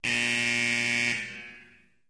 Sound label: Ice Hockey Arena Buzz